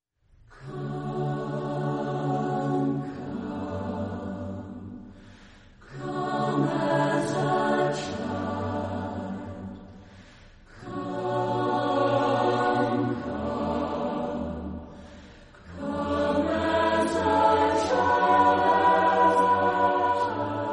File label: Chorale, Voices in Praise acappella